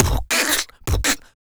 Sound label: Human Beat Box, Drum & Bass; Loopmasters